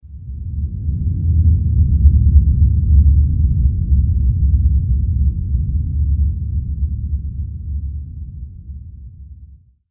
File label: earthquake